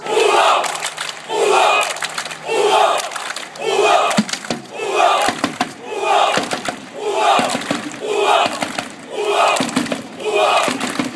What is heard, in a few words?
rouen rouen rouen, chants